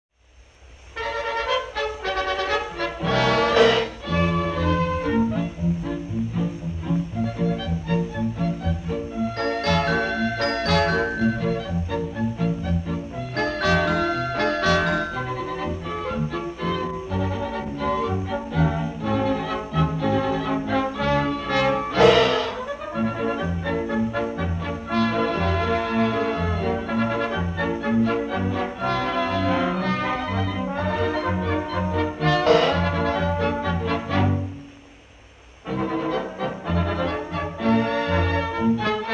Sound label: Viennese Orch; Frog King